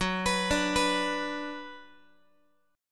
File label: F sharp Suspended 4th Sharp 5th, Guitar; arpeggiated; x,14,14,12,15,x